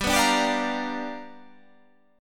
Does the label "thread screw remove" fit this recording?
No